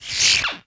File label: popup; Gadgets; XCOM